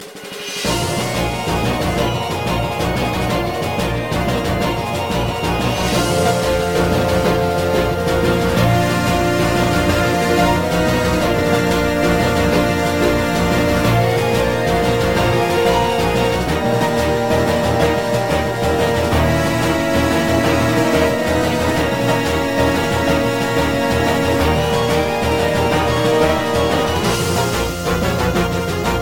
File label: Battle Boss